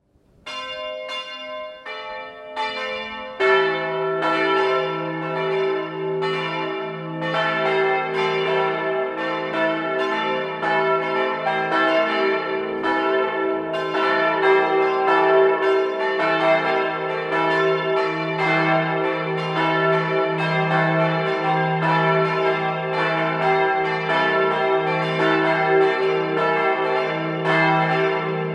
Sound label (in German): Patmoskirche Vollgeläut